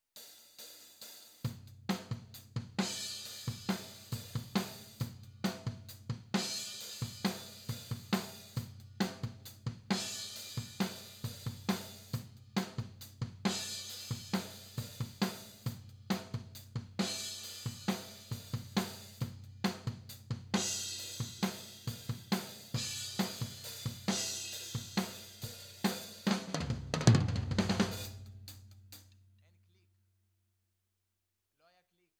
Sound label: Mid Tom